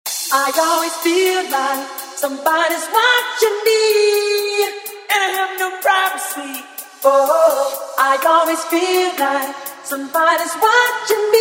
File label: Acapella Intro; DJ Mhark; ReDrum